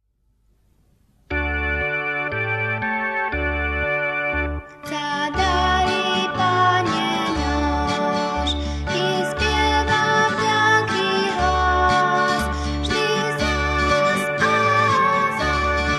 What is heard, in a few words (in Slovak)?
Slovak Piesne Pre Deti